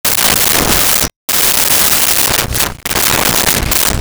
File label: Silverware Drawer Remove Item, Kitchen